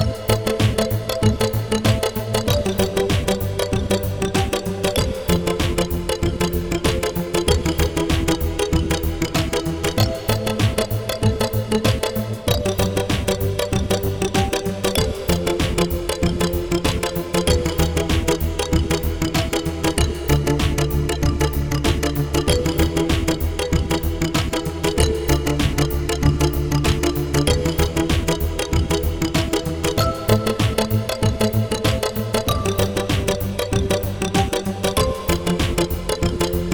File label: Monkey Drama, funny